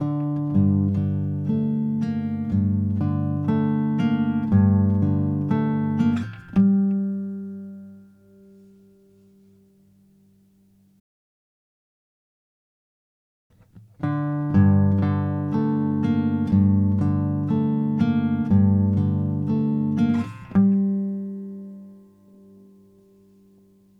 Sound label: Gm120 GTR motif